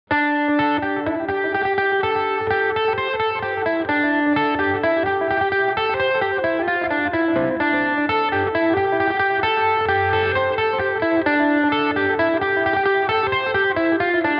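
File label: D dorian, irish mandolin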